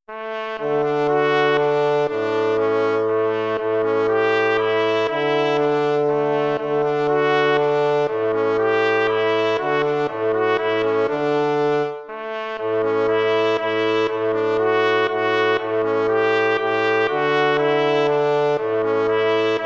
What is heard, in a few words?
waltz; Ferdinando Carulli